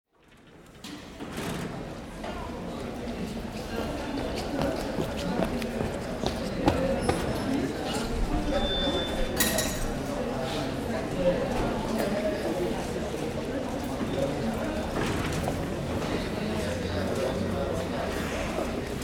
ferroviaire balance gratuite

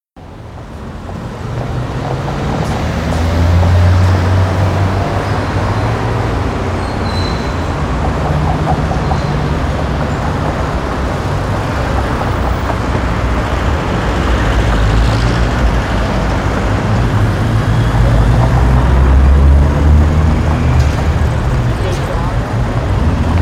traversee avenue

